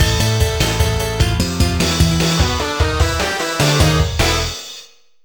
Game Over; Hajime no Ippo: The Fighting!; PS1

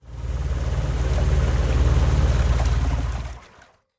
Motor Power Down; Going Under; Hustlebonez Founder

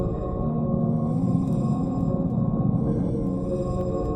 Low Action Halloween; lethal company

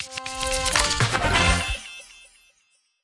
Unlock Stage; Subway Surfers City; Android, iOS

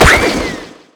Hat KO; Hat SFX

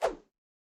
Swing Small

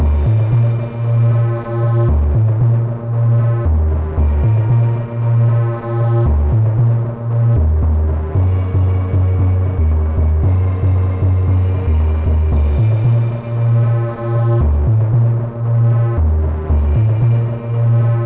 Music Test GROUP AMBIENT

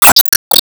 Enemy Metal Damagehit; gamerip; disney star smash; android

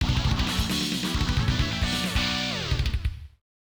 Game Over; Cyberbots: Fullmetal Madness; CP System II

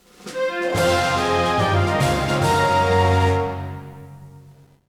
Player Obtained jingle; CIRCLE FORM; Inazuma Eleven Everyday; 3DS

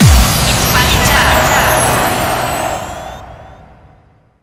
Login Decided; beatmania IIDX 14 GOLD; Arcade, PS2

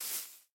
Grass; Minecraft: New Nintendo 3DS Edition

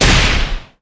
Enemy Pirate Anchor Hit; gamerip

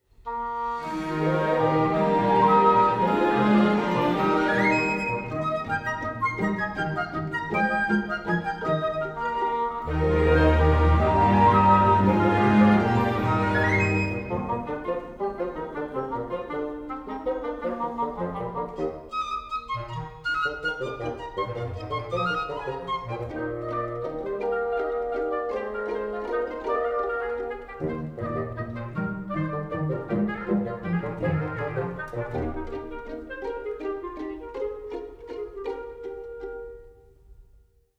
Woodwinds Variation; Symphonia Original Soundtrack 2024; Celebration

